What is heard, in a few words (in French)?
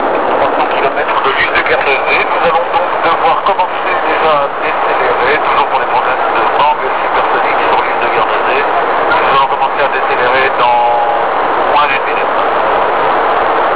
Debut de deceleration, aviation